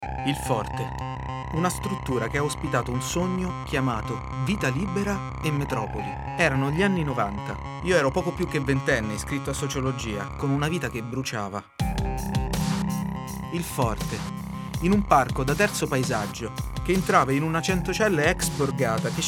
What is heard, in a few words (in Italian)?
musica voce